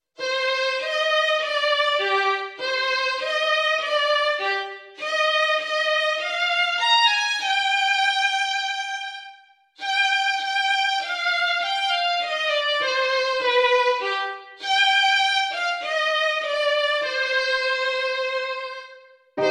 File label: Lullaby